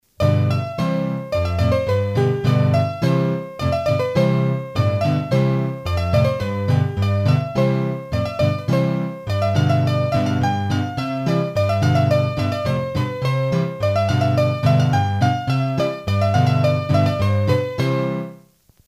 Polka Piquee, hurdy-gurdy

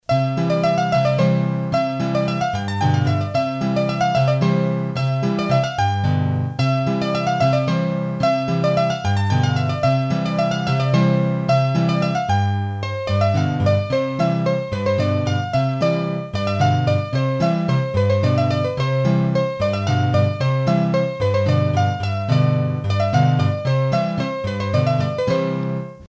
Bourree, hurdy-gurdy, Auvergne

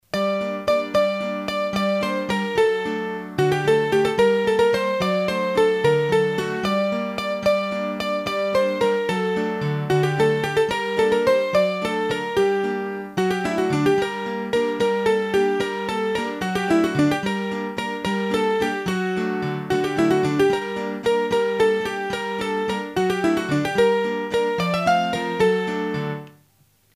Valses, hurdy gurdy; Massif Central; Detournement Mineur

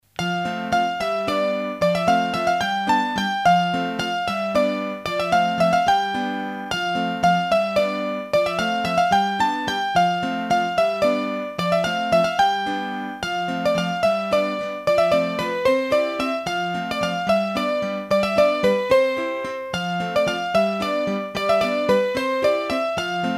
Bourree, hurdy-gurdy, Auvergne; Massif Central; Bourees d'Auvergne

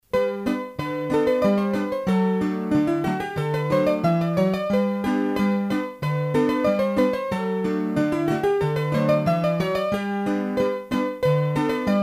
Scottische, hurdy-gurdy; Massif Central; Scottische a Plumet

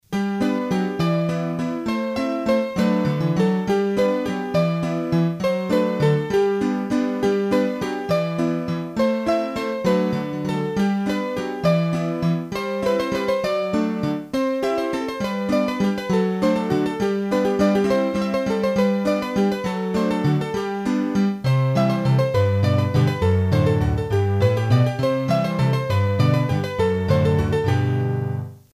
Valses, hurdy-gurdy; La Marianne

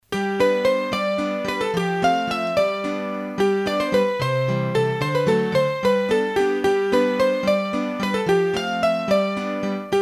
La Soufflette, hurdy-gurdy, Valses; Massif Central

